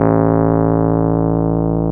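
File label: Piano Rhodes; Club-50 - Foundations Roland; FRhodes2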